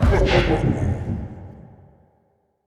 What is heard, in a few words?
dark Army trigger; upgrade display Of True Might